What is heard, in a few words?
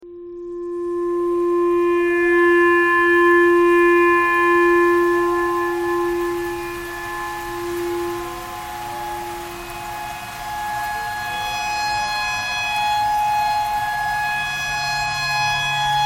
tornado siren clarinet thread pentatonic